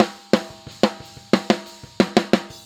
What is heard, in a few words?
Snare; Pro Tools Essential Demos